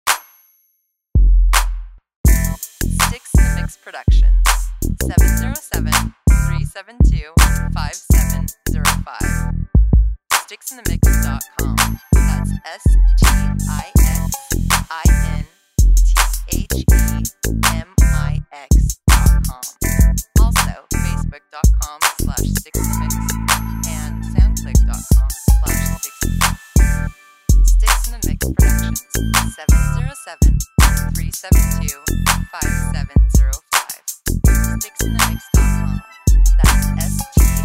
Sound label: Chill Water